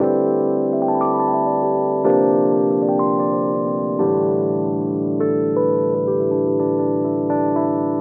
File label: E. Piano; Keys